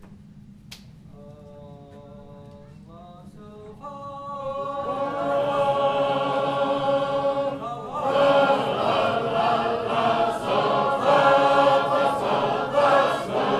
Reese

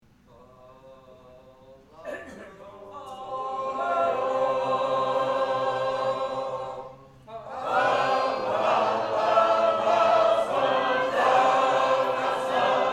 Reese

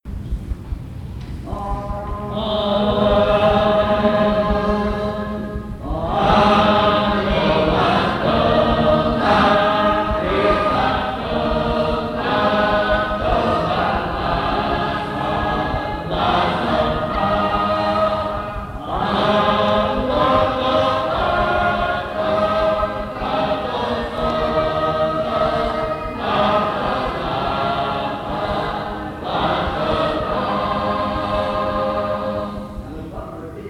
O Happy Day, Annual Singing; Dallas, TX

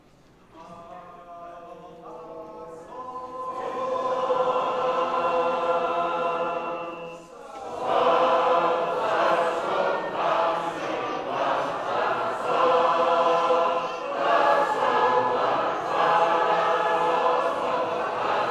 Harmony

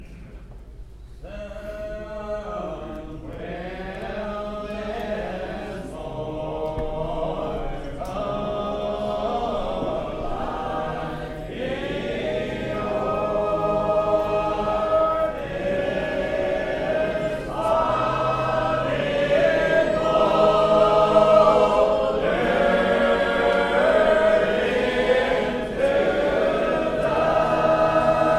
sung old style; Eureka; Hoboken, GA

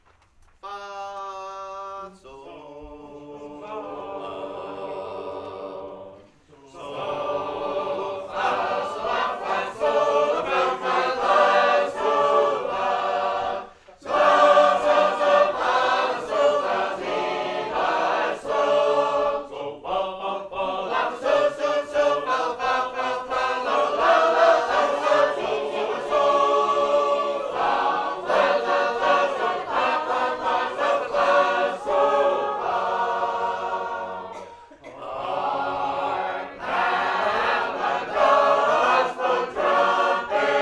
Gospel Trumpet

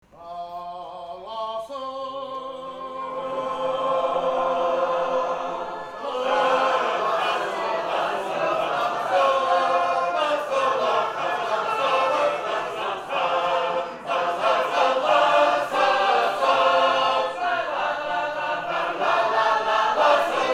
Harmony

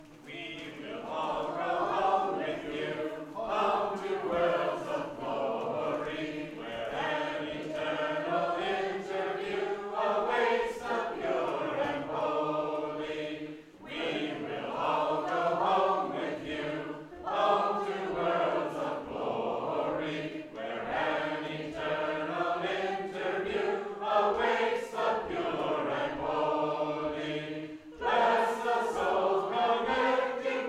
We Will All Go Home With You (Shaker Tune)

